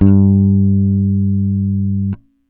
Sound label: Bass G, Electric Bass One Shots; Slate Digital BASSHOLE Sample Pack